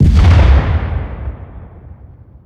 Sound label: ground pound; weapons; dota; primal beast